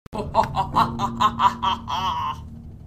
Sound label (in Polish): smiech